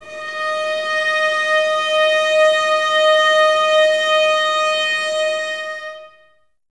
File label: STR VAS D#, Violas, No Vib; Miroslav Vitous